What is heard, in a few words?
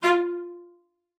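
cello spiccato F4, cello; Xsample VOL-5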